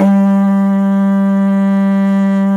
G2 HORN, Didgeridoo; Big Fish Audio; DIDGEHORN1

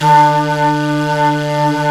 Flute, Singin' Flute

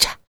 FEM PERC, Vocal Jazz FX

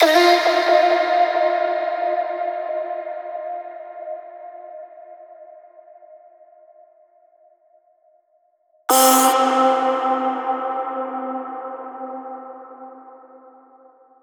Vocals

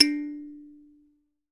THUMB PNO, Kalimbas; E-MU Producer Series Vol. 7 – Old World Instruments

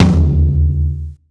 Tom, breakcore Drum Hits

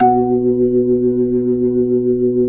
B-3 Jazz, keyboards

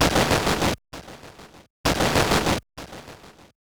Rythmic Noise 130BPM

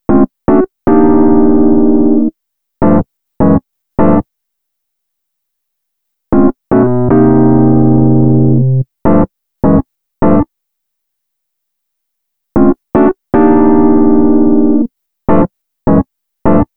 Trampy Pianos, Electro Lounge; Sonic Foundry (Sony Creative Software); 077 Jive Woman

